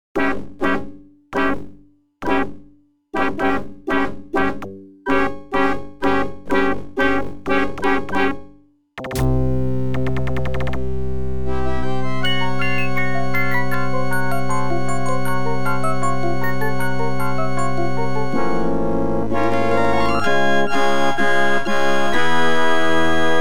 OPL2; Death Star Destroyed; X WING

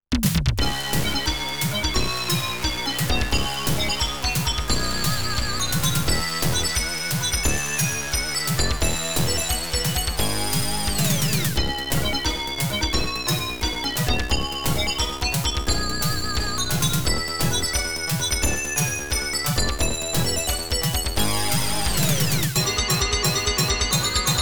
OPL3; CYBSPHERE

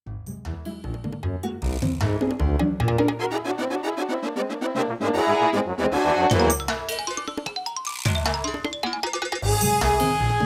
SC880; the incredible machine 2

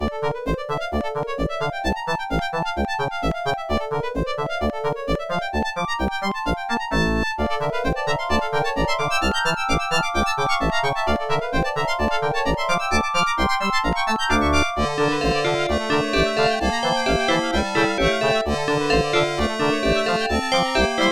OPL3; tetris classic

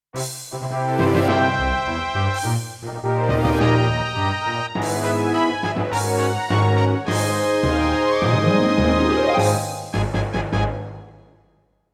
CM500 GS; LSL3

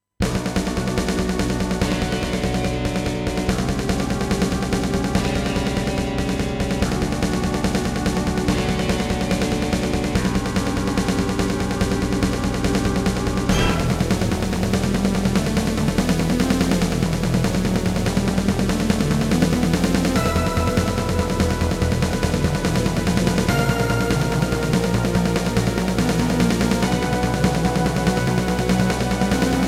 SC880; Dune 2

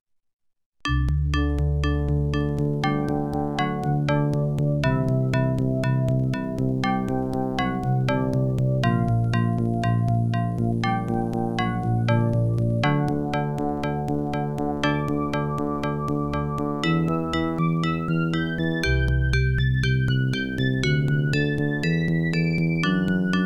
OPL2; Yamaha OPL2; Jill of the Jungle

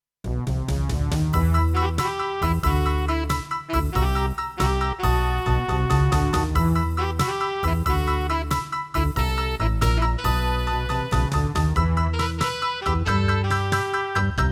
CM500 GS; Larry 3 passionate patti in pursuit of the pulsating pectorals

